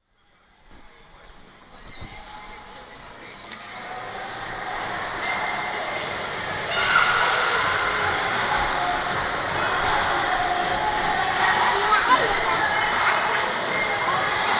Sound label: swimming baths